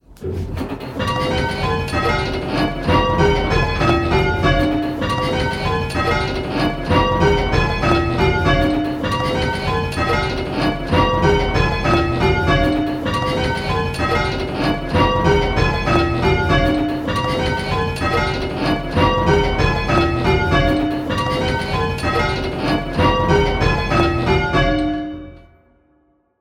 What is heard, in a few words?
Striking 6-Bell Call Changes; 132546 (King's); Pebworth